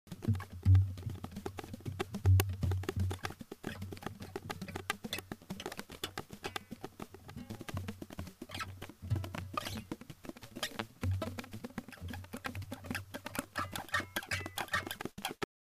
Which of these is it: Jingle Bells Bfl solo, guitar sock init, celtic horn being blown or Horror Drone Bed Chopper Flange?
guitar sock init